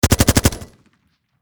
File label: Warfare Machine Gun Hkg36 Short Burst Close Perspective; Heckler Koch